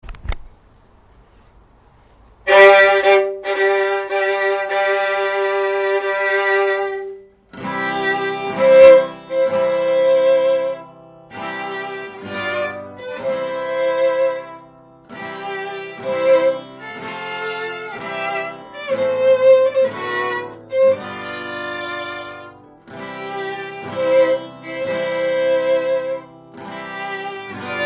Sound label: Bridal Chorus